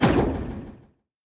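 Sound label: dragons enemy shadow alfa cloak; sfx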